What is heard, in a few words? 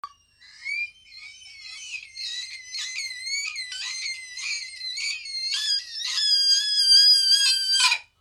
tiger thrush